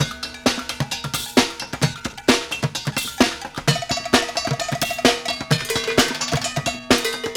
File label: Street Groove, Ambient Grooves; Sonic Foundry (Sony Creative Software)